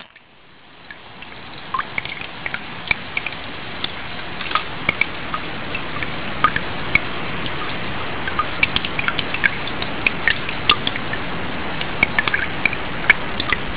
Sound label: night; Sensorium; kawasaki